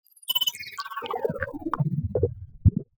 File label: Data Streams System Shutdown; soniKSound